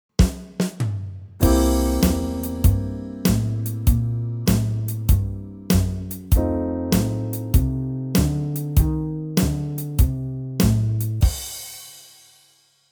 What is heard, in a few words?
No Bass